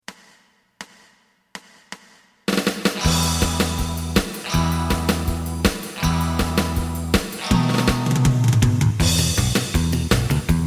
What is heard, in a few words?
lead